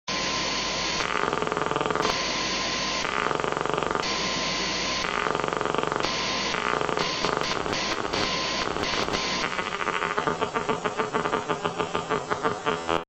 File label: static; insideout; video